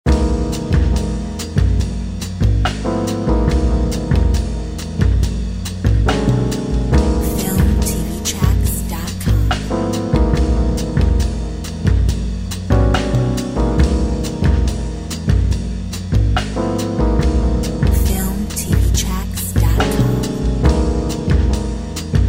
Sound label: Acid jazz Club